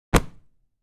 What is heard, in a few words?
Cloth Character Movement Foley Whoosh